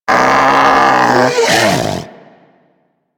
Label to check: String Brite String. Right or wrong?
Wrong